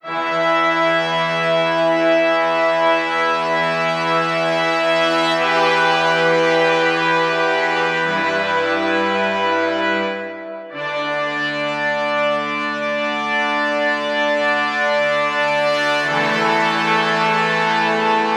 Low Brass - Em 90BPM; STEMS; Ghosthack; SkyRealm; Mood Kits; Mood Kit 05 - Hands Of Time

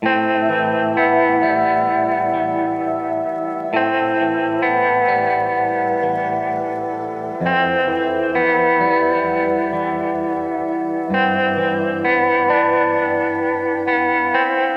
Sad Melody Loop - 130 BPM A Min; Cymatics; Oracle Sample Pack

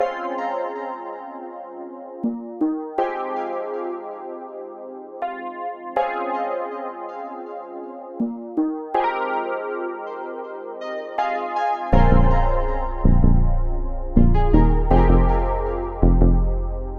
161 BPM F Min, Vintage & RnB Melodies; Obsession; Cymatics

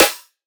Snare, E; Drums; One Shots; Snares; Cymatics; EDM Starter Pack

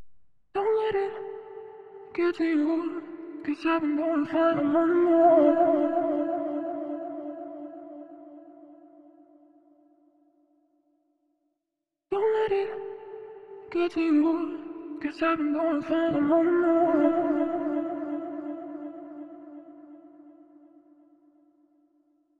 166 BPM A Min Vocals, RnB; RnB Collection; Gave It All

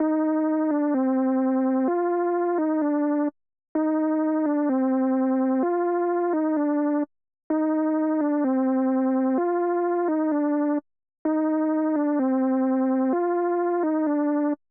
128 BPM C Min Juno-60 Lead; Synth; Loops; Loop Stems; ARP+JU60; Cymatics; Fantasy Synth Sample Pack; Fantasy - Yesterday

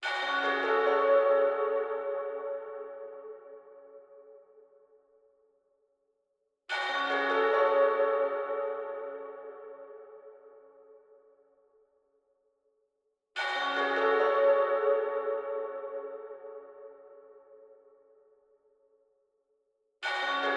144 BPM F# Min FX, Drill; Melody; Stems; Cymatics; 2022 Melody Collection (BETA); On The Run

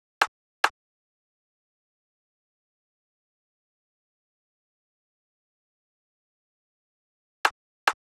Drum Loop - 141 BPM Percussion, Drill; Loop Stems; PARADOX II - Drum Loop Collection; Cymatics; Harpoon Drum Loop

